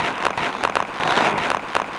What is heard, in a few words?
Crunchy, Beats